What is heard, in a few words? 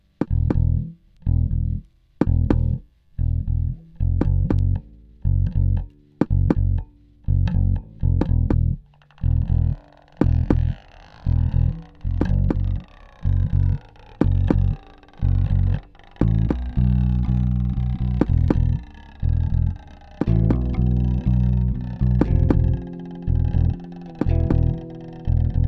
Music